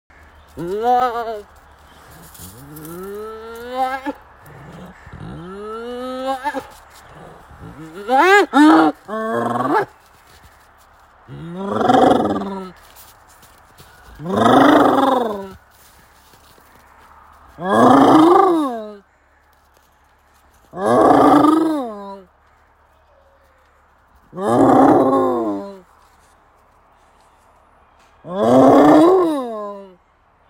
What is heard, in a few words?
Hyena long